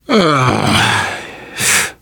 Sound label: negative noise, survivor voice, biker